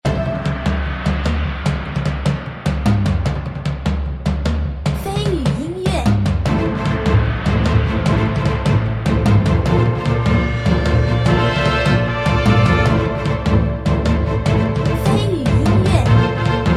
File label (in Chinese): 紧张 碰撞 史诗